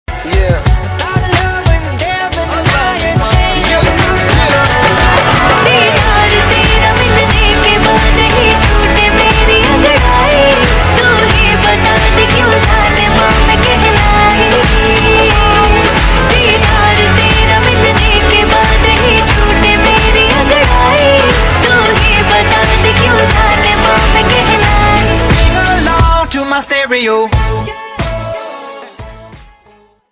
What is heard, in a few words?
Remix Ringtone